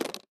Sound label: metal pickup; container; Escape from Tarkov